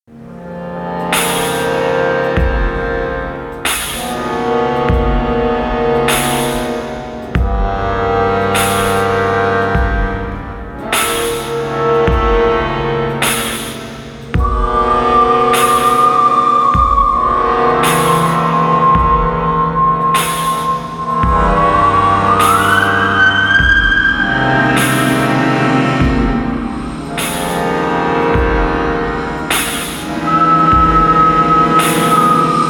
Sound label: threat sirens call